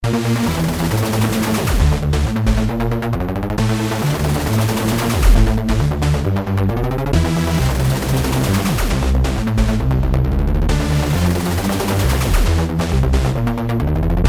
Dubstep song

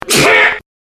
Sneeze

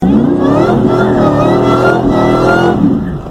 Supercharger

